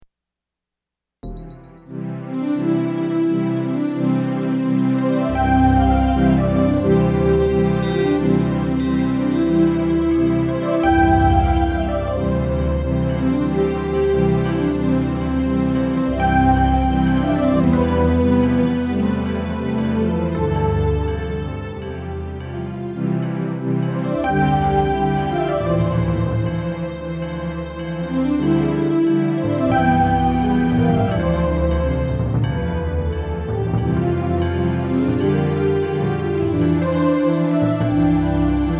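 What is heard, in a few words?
Chaos Drums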